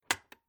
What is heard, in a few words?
Household Old Wooden Writing Cabinet Chest Draw Handle Released; Drawer